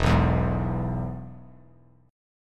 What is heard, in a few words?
E-Major E; strummed; JGuitar